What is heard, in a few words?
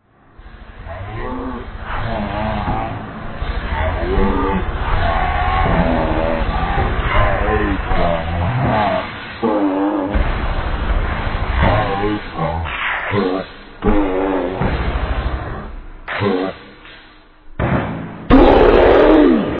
truck